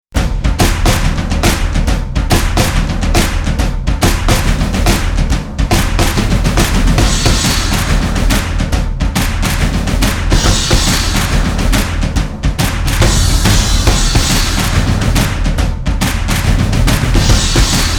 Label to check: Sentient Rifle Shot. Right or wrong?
Wrong